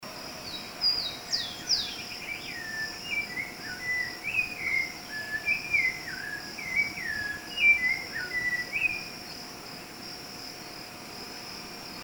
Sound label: Turdus rufiventris; PN Itatiaia; Silveira